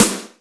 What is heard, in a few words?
Rock H, Yamaha DTXpress Acoustic Snare